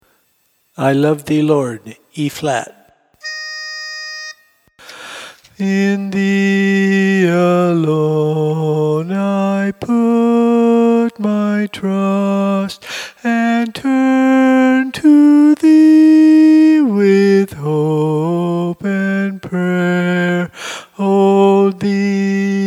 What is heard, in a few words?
Lead Only